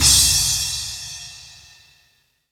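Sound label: cymbal, dance drums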